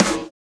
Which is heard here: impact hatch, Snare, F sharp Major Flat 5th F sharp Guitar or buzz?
Snare